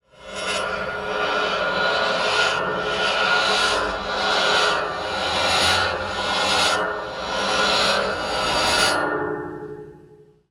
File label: sound design metal scrapes reversed reverb; zapsplat